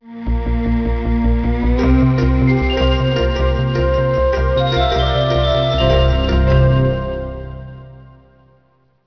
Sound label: upbeat electronica intro